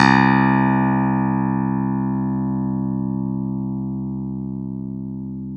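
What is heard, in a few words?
clavinet; Roland LCDP10 Keys of the 60s and 70s 2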